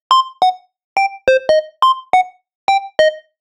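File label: Groovy Bass, эмбиент; Techno Trance; 140BPM; loop; Сэмпл синтезатора (Техно-транс): Confu synth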